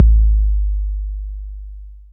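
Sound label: bass drum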